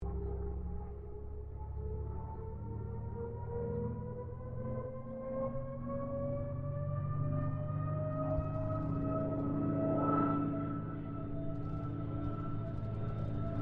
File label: AIRCRAFT CA-13 BOOMERANG PASS STEREO